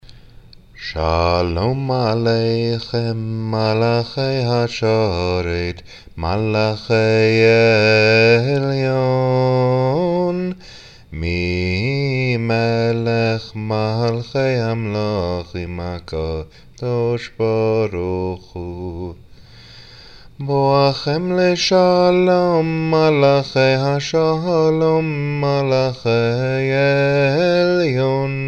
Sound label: Shalom Aleychem Traditional